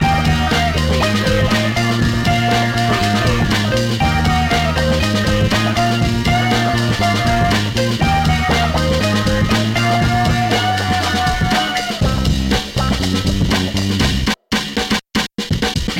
beatnik loop longer

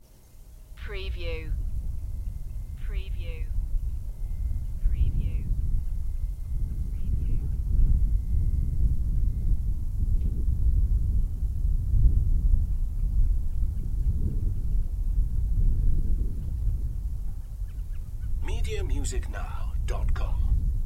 Noisy wind turbines and wind; beanstalk audio

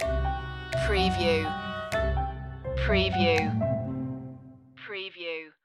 a quirky flourish stinger

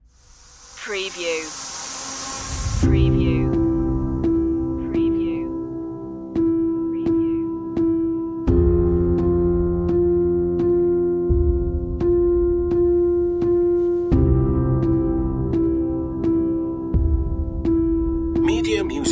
sad trailer

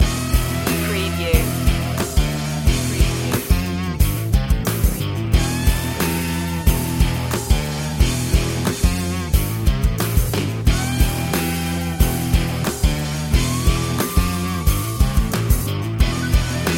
biker babe loop

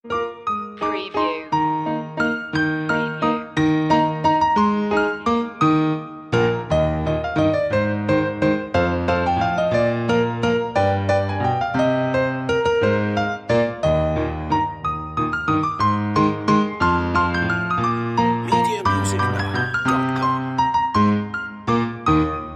we wish you a merry christmas piano; media music now

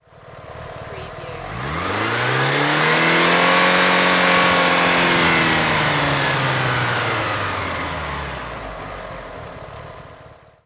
MITSUBISHI EVOLOUTION ENGINE LONG REV

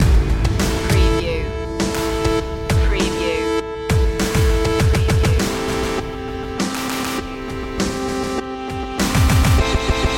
electro slam long looping

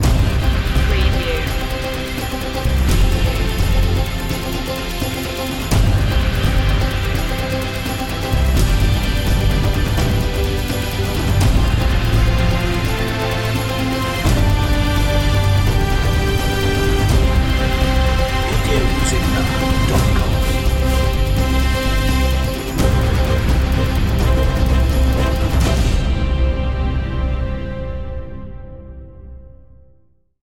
song of glory no choir

